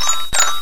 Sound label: xylofon melody; gcompris-qt